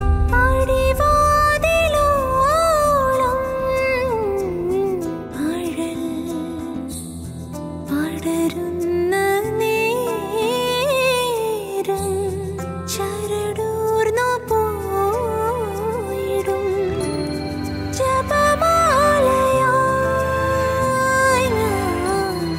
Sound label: Female Ringtone